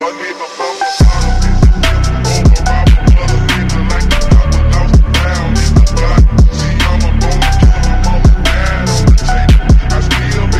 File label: Evil Rap